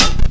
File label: HH Rip, Hi Hats; R&B Drums